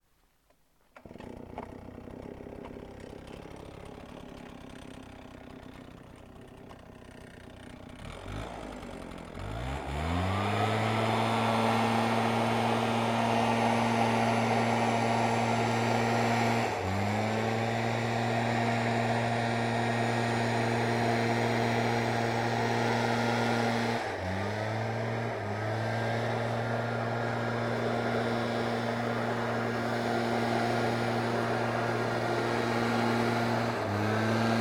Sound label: Leaf Blower - ONBRD - WORK - Start Run Off - EXHAUST; Pole Position; The Garden Tools Library; DPA 4062